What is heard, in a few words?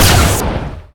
plasma rifle fire; weapons